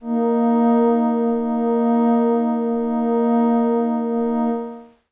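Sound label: Glass Howl Kawaii D sharp 6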